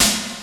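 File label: US SD, snare drum; Crypton - DrumBase5000; CD DRUMS #1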